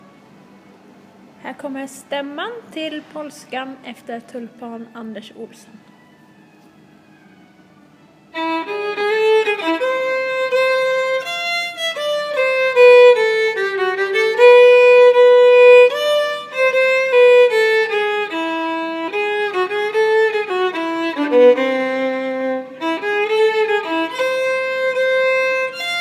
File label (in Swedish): Stämma; Tulpan Anders